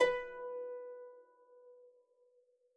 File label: B3 Harp